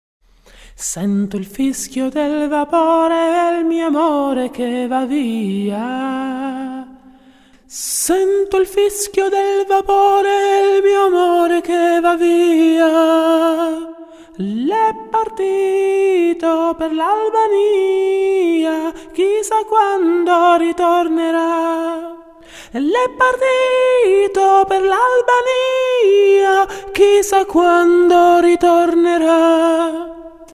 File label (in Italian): soprani